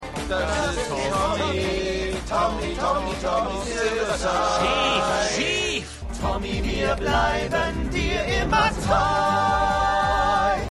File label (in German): Gesang